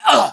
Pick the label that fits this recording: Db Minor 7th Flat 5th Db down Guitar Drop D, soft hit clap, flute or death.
death